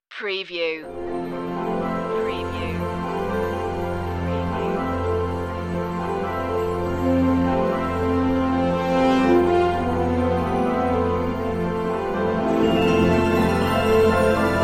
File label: a forest glade no choir